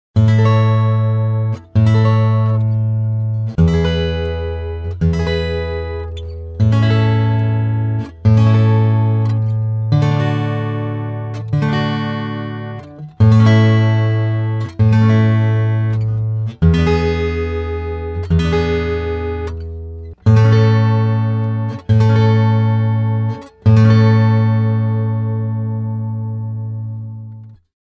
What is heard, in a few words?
D-35 before, guitar